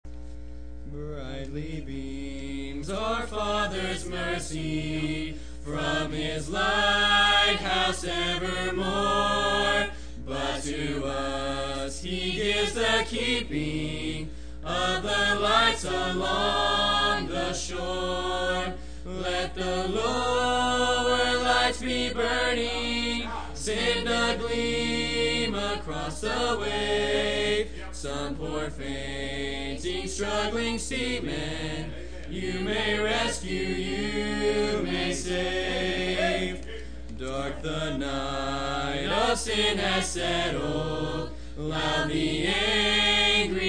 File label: Young Adults singing; 2015 missions conference